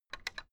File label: fence gate latch rattle; objekte; tueren